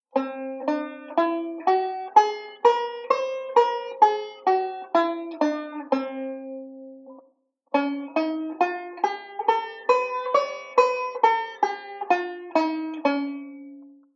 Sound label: Whole Tone Scale; Beyond Chord Melody Volume II